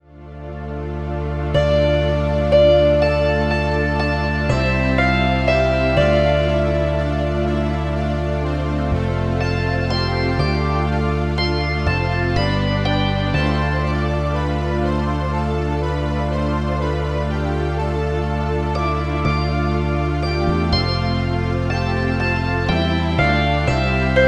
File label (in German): hackbrett